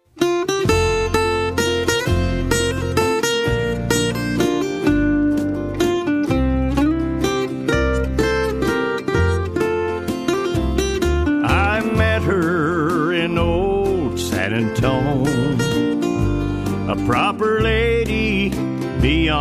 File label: San Antonio Waltz